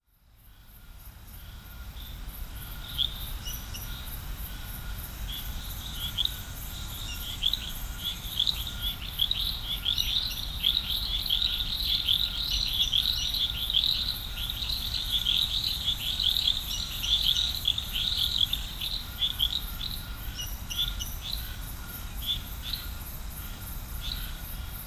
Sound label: Frog Chorus Serrinha Do Alambari, Brazil